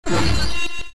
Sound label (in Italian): suoneria botton; beep